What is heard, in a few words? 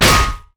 basic attacks; LoL; vi